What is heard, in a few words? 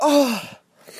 moan